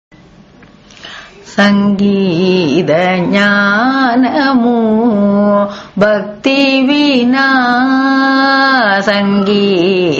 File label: raga dhanyasi cine, carnatic music